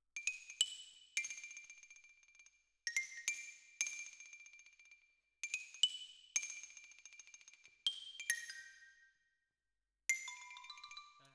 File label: Xylo